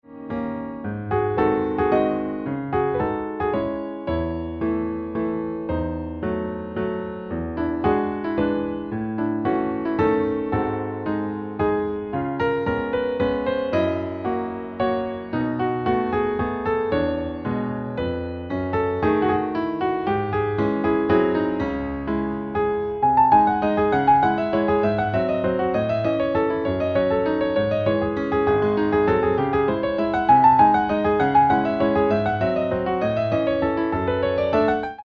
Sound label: Waltz